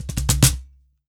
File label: drum, mixed drums, World Instruments